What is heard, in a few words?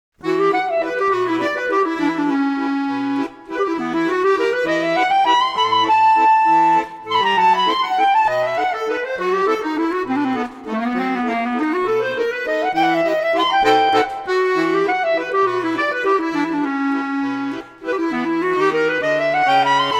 French Touch 90s